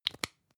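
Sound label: lipstick close hard, Bathroom; haus-garten; badezimmer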